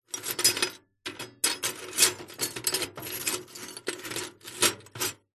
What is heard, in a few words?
glass pieces broken rummage in metal container; zapsplat